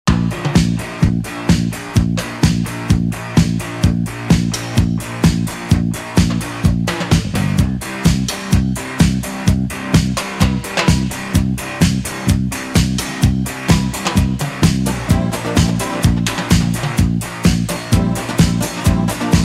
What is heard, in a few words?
macho roll